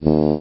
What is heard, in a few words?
french horn